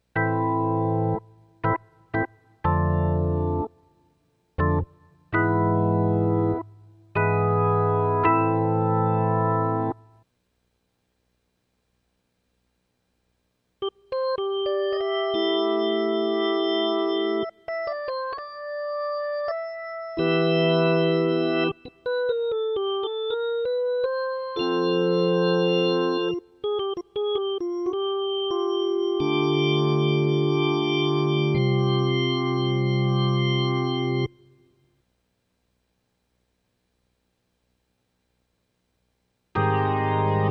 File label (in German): Orgel